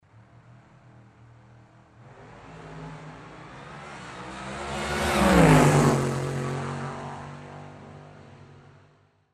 zvuk dragstera

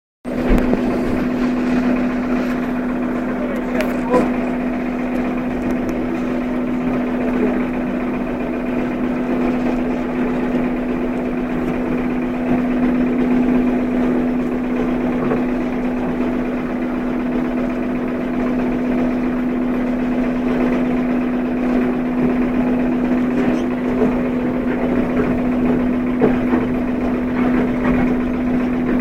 ezda na trollejbuse

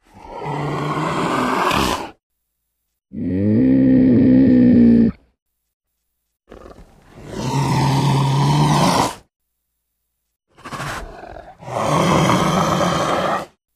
zloj ryk leoparda

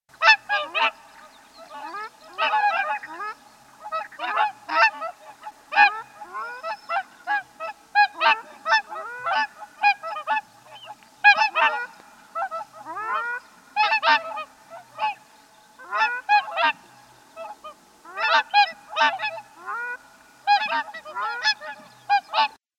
golos lebedja klikuna

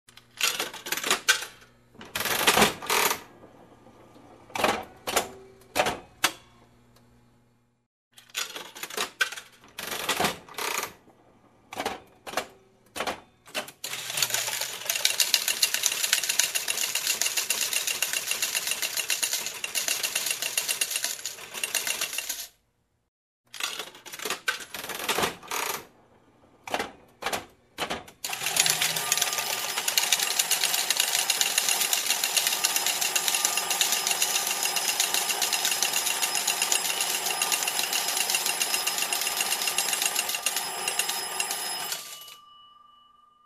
zvuk igrovogo avtomata

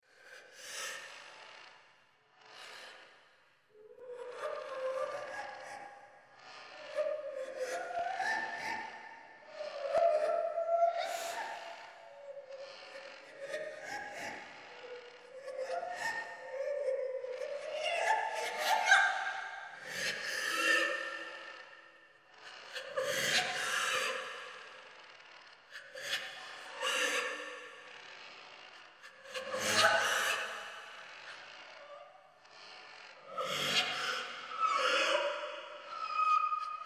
Плач Девушки

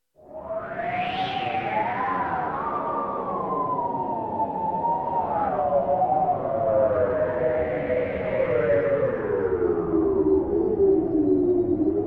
Neizvesten Zvuk v yugi

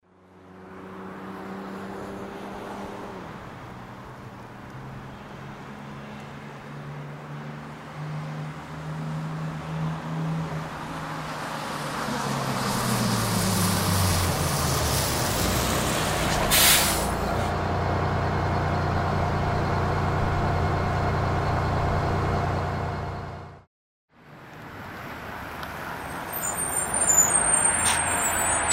zvuk avtobusa priehavshego na ostanovku

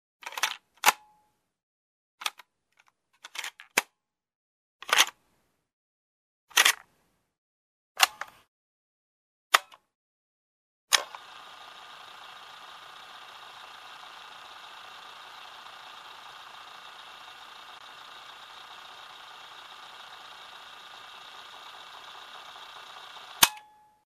zvuk magnitofona i kassety

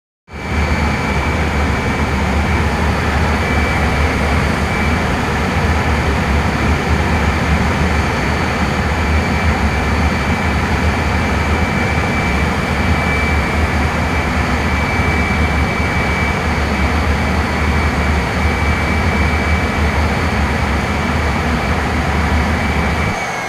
zvuk turbiny samoleta

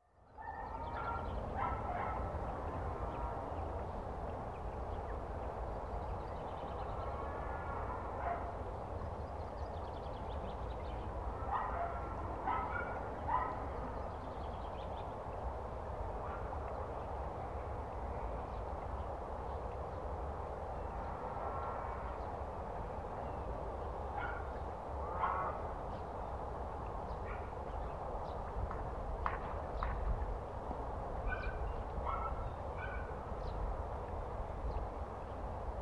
zvuki dvora na ferme

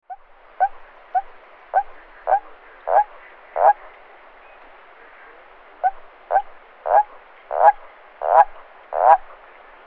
seraja zhaba

